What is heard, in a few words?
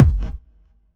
Kick, Drum Hits